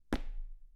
Step on wood

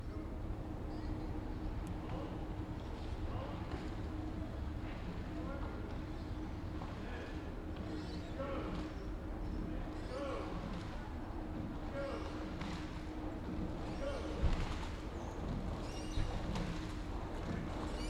Race Rowing Boat Passing

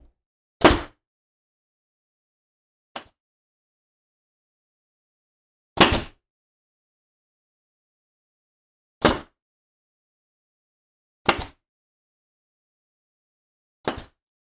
Nail Gun

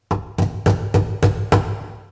Knocking To Gate

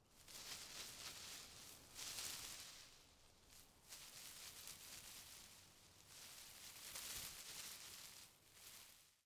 Rustling Bush Leaves

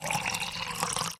Quick Pour

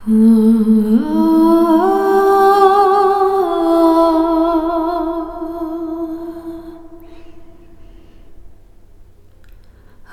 Ascending Wordless Female Vocal

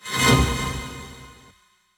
Accordion Attack

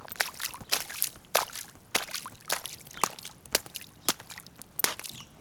Puddle Splashing

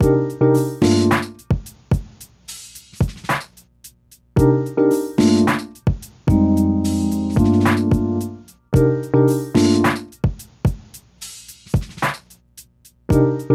Background Music Loop

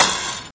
Metal bar

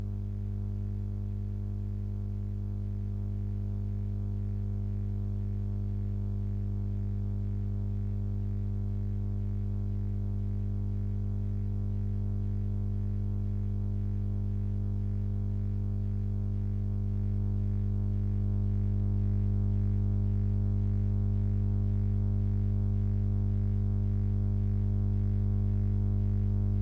Electric Hum Buzz 50hz

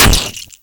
Splash Death Splash

